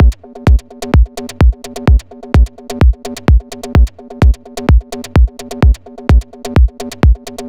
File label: techno 2pole; Techno Tutorial